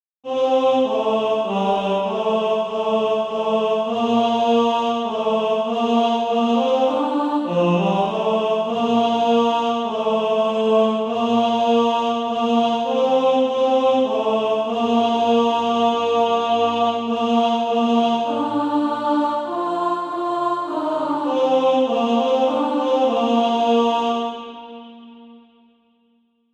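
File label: tenor, Practise Music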